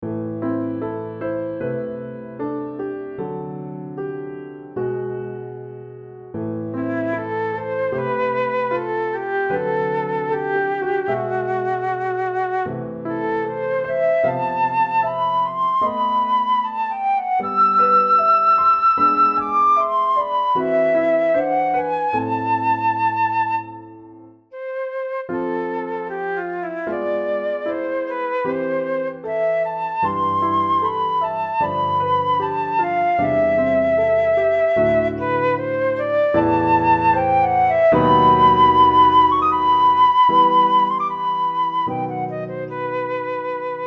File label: Flute piece